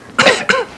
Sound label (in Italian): persone tossire; tosse